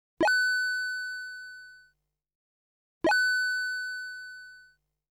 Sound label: (Sound Effect) Income, String Quartet